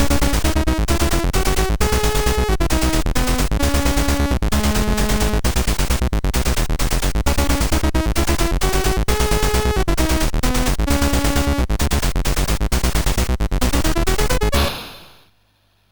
MOS6581R3, GAMES; Strike Fleet

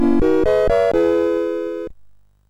sid MOS6581R3; Roadwar Europa

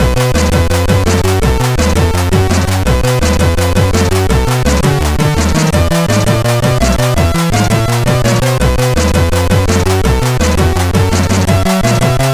Rock n Roll, CSG8580R5

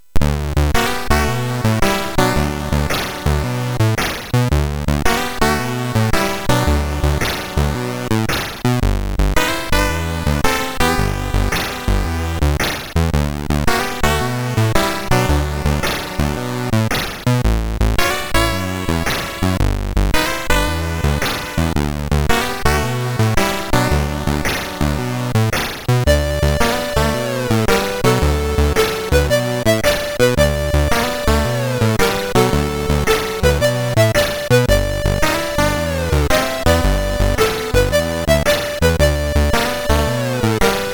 Final Synth Sample, MOS6581R3; hvsc

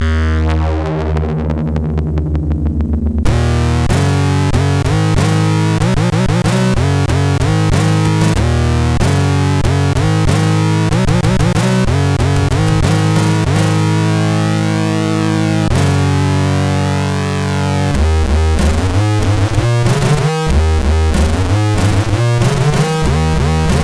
Iron Men, MOS6581R4

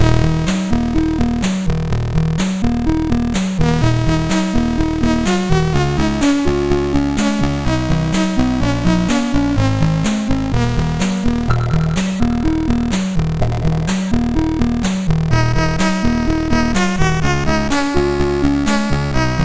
Gametune, MOS6581R2

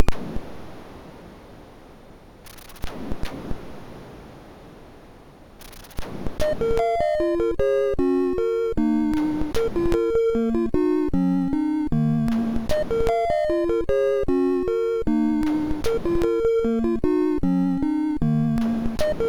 Snowflute, MOS6581R2

